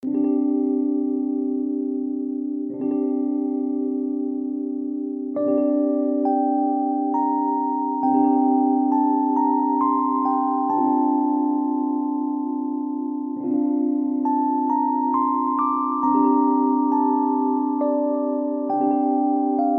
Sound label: water quiet; Undertale